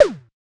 Tom Metatom High, Drums

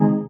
balloon select; There; ThereClient

